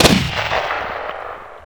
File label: Gun Shot; Sonic Foundry (Sony Creative Software); Club Joints and Street Anthems; Ruff Side